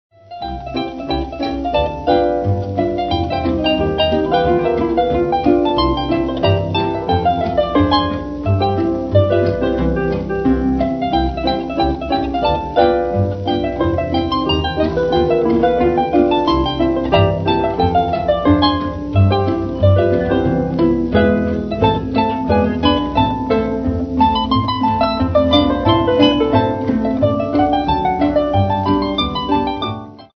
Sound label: harp